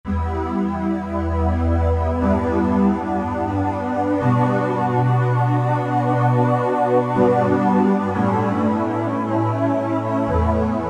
E-mu Proteus VX Transpander

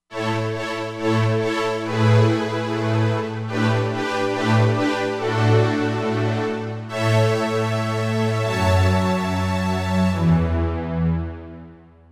Stereo String

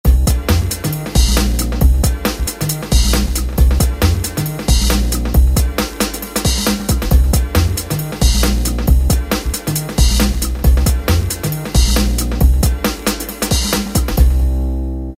Future Jazz, Factory Patterns; Korg ES-1

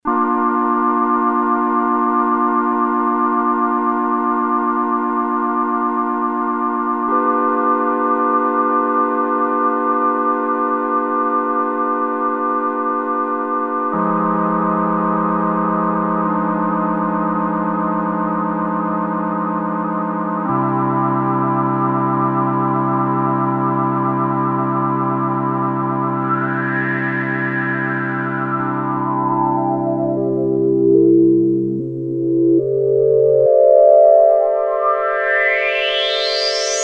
Sweeping Harmonics; Sequential Circuits Prophet-5 (Ver.3); Factory Patch

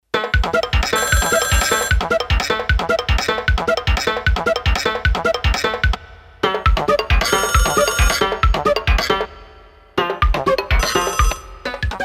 Very Busy, Roland D-10; Factory Patches

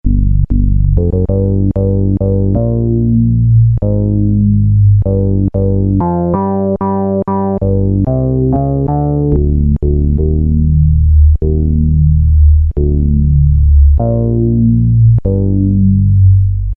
OW Bass, Roland Juno-106; Factory Preset

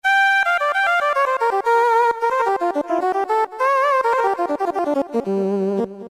Synthesized Sax; Roland PN-D50-03

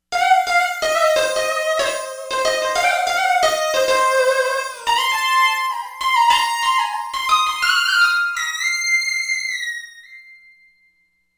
ethno lead, Korg; Korg MSC-13S Ethnic 2

